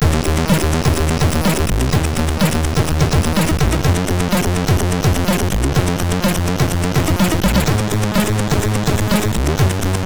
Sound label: Bass, sid MOS6581R3